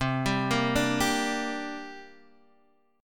C 7th Suspended 2nd, Guitar, Half Step Down; C7sus2

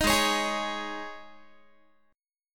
Ab Suspended 4th, Guitar; strummed; Absus4

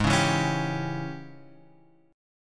G sharp Major 7th Suspended 4th Sharp 5th; G#M7sus4#5 Chord {4 4 2 X 2 3} strummed

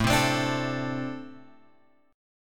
A-Minor Double Flat 5th A; Ambb5 Chord {5 3 X 5 3 5} strummed

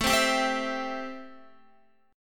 A-Minor 7th A Guitar; Am7 strummed; JGuitar

